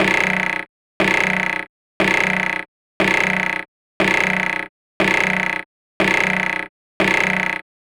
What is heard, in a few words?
Alien Animal; Ghosthack Foley Samples and Sound FX